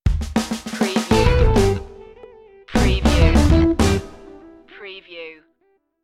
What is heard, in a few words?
funk side stinger